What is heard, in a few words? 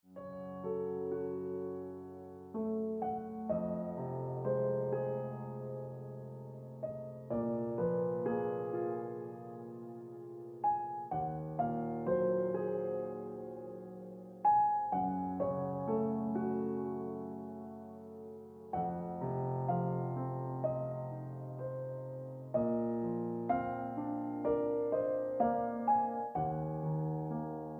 Ringtone Piano Music